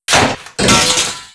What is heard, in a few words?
checkpoint bar remove, doors